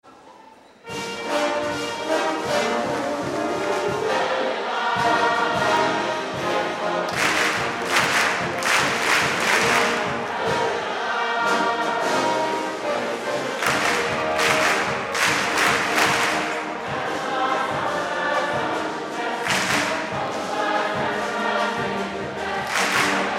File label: Pep Song